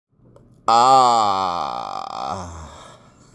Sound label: Ahhh